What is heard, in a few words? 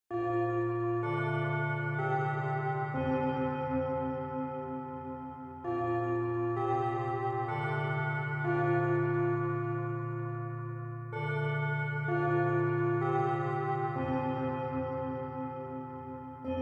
Japanese School Bell Far Long